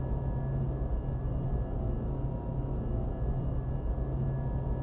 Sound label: hangar hallways ambience; Star Wars Obi Wan; XBOX